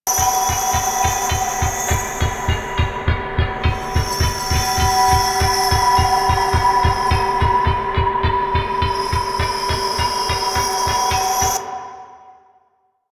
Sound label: Spinning Dial Small Loop; Warframe; Ambience; Entrati